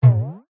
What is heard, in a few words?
cartoon impact bounce; zapsplat